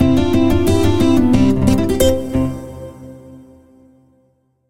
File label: level won; 摇钱树